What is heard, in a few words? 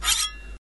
Button Metal Rollover; dino donkey dash